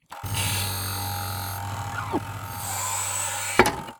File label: circuit printer